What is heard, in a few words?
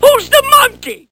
lion